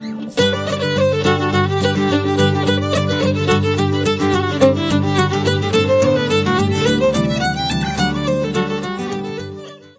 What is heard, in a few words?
fiddle